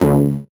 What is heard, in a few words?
VocoBit Noise, 8-bit; musicradar; 8-bit bonanza